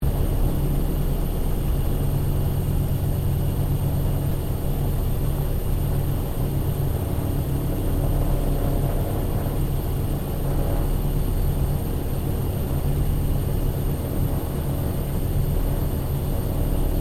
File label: 1941 LOCKHEED HUDSON IDLE INTERIOR, Hudson twin engine light bomber; Airplanes; Vehicles